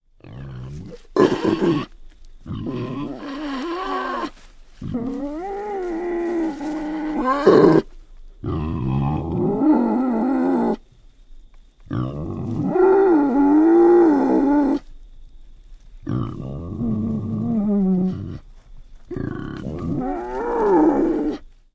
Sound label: Boar Wild Grunts Grow; boars